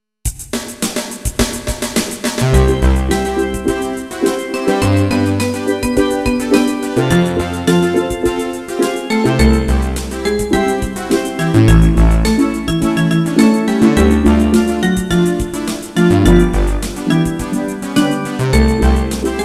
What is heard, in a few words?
Reggae; An own arrangement of the Swedish Lucia song "Sankta Lucia". An interesting reggae variant of Sankta Lucia